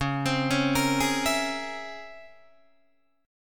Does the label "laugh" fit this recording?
No